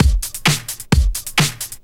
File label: drum 130 straight; Techno Trance Essentials